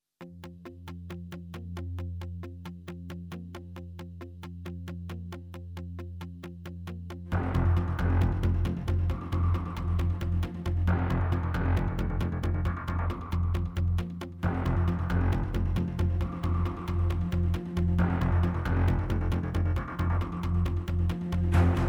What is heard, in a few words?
No Perc; Brand X Music